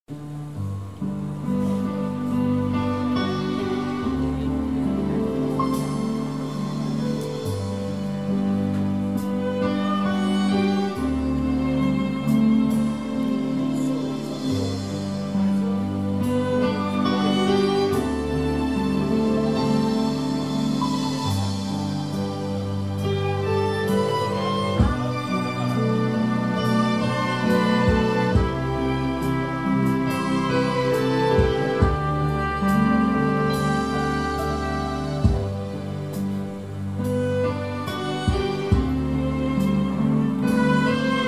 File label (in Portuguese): Piano e Cordas